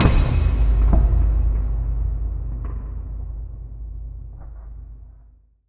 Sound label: BOOM Atom Smasher, Cinematic Metal Impacts